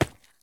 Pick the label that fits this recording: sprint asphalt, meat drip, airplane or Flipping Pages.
sprint asphalt